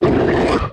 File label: stomper warcry big, enemies; Factorio